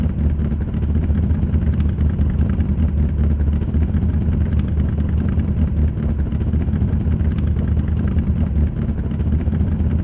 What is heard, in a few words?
idle, vehicles cars; lwcars